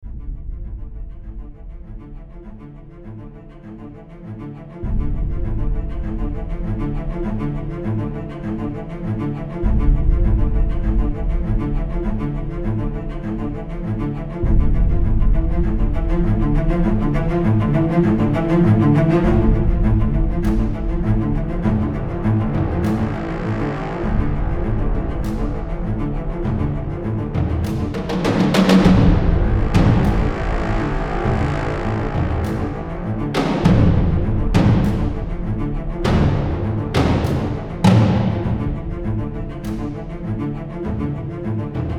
cinematic trailer music, cinematic orchestral action trailer